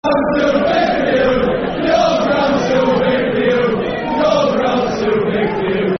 Your Ground's Too Big For You, Football Chants (Soccer Songs); Sheffield Wednesday Swfc